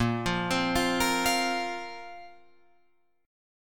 Bb Suspended 2nd Bb down Guitar Open F, Bbsus2 chord; arpeggiated